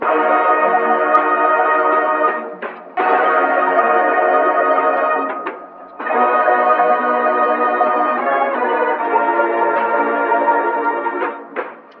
horns

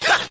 huh, Voice; ooh ahh; heh huh

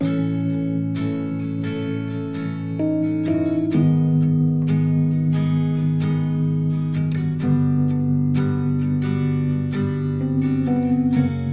guitar, Sad

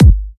yuck, Kicks Electro

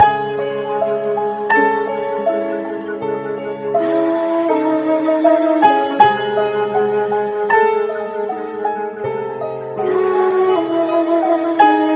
piano and voice, Sad Loops

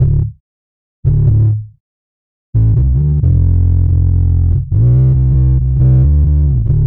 bass

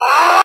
"ah", 8 bit fighting guy

